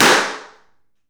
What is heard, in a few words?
Balloon Pop; SteamVR; InteractionSystem; Longbow